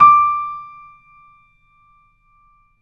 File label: BOSENDORF; E-MU Producer Series Vol. 2 – More Studio Essentials; Keyboards; Bosendorfer